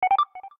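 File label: Full Battery; Alcatel; OT-903; ui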